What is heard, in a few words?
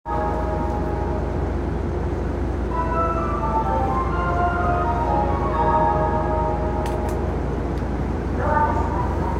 train sounds; 南北線王子神谷北